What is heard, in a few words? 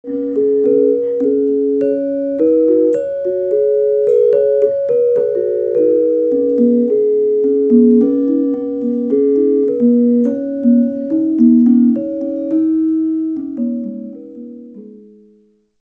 Gender solo